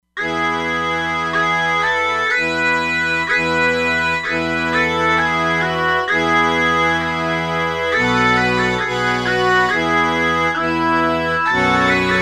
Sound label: Chanter Pipes, E-mu Proteus-3; Factory patches